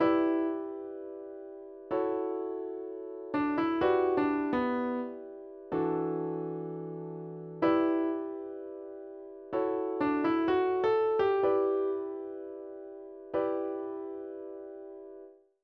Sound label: instrumental